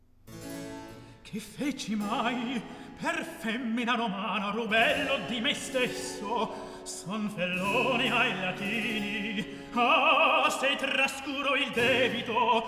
Recitativo; Atto 1 - Scena 15; Geminio, Manlio; Che feci mai!; Antonio Vivaldi; Tito Manlio (2005)

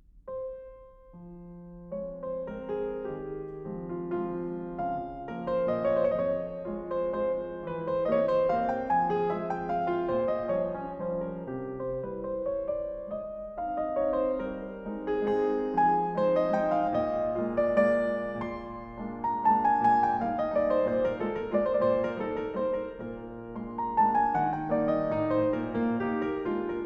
Valzer in fa minore, Piano

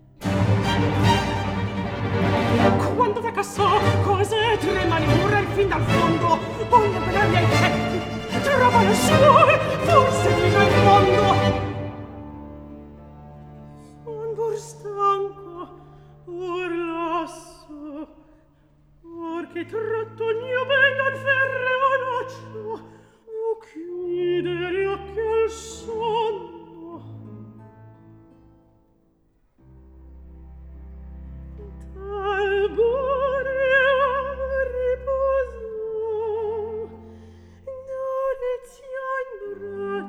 Recitativo accompagnato; Orlando; Antonio Vivaldi (1678 - 1741); Vivaldi - Orlando furioso (2004); Atto III

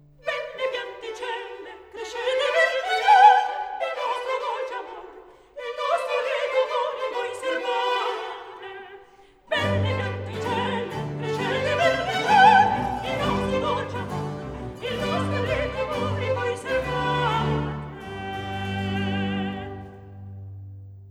Duetto; Scena 12; Angelica, Medoro; Belle pianticelle; Antonio Vivaldi (1678 - 1741); Vivaldi - Orlando furioso (2004); Atto II

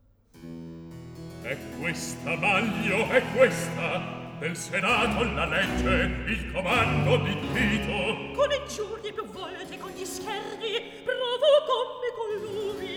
Recitativo; Atto 2 - Scena 4; Tito, Manlio; E questa, Manlio, e questa

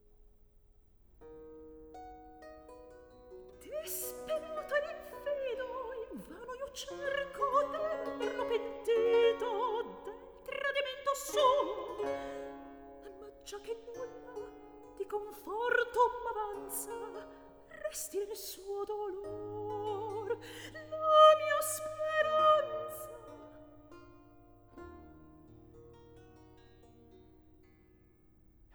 Recitativo; Atto II Scena 5; Tullia; Antonio Vivaldi (1678 - 1741); Vivaldi - Ottone in villa (2010)

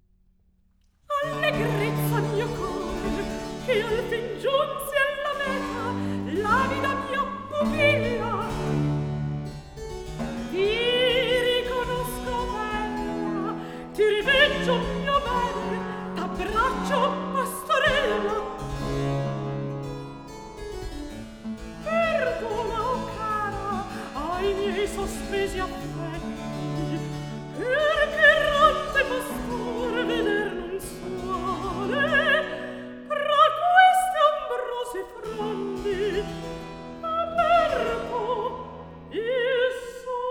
Cantata, Recitativo; Cantata, RV 680 'Lungi dal vago volto'- III. Recitativo; Antonio Vivaldi (1678 - 1741); Vivaldi - Concerti e cantate da camera III (2005)

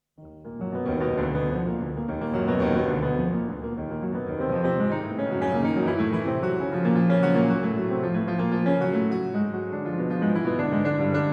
Preludio in mi bemolle minore, op. 28, Piano; Chopin - Piano Works - Vladimir Ashkenazy

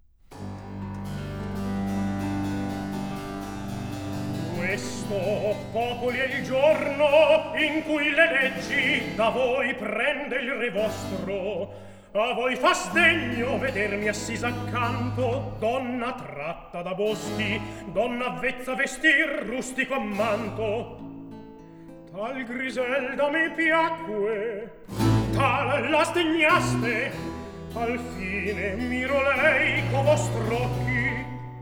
Recitativo; Atto I; (Gualtiero); Questo, o popoli; Antonio Vivaldi (1678 - 1741); Vivaldi - Griselda (2006)

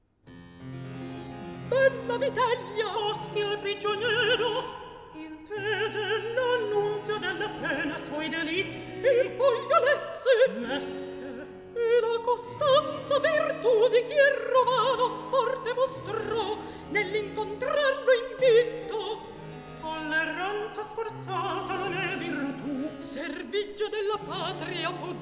Recitativo; Scena 5; (Lucio, Vitellia); Bella Vitellia; Antonio Vivaldi (1678 - 1741); Vivaldi - Tito Manlio (2005)

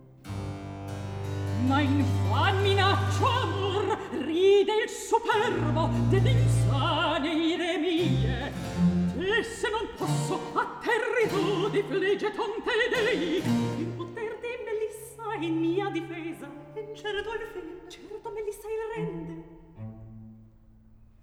Recitativo; Scena 3; Alcina, Ruggiero, Bradamante; Antonio Vivaldi (1678 - 1741); Vivaldi - Orlando furioso (2004); Atto III

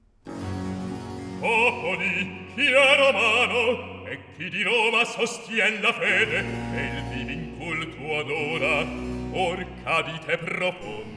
Recitativo; Atto 1 - Scena 1; Tito; Popoli, chi e Romano e chi di Roma; Antonio Vivaldi; Tito Manlio (2005)

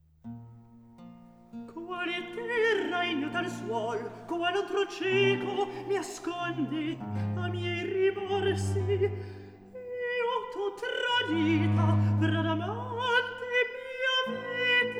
Recitativo; Atto II; Scena 4; (Ruggiero, Orlando)

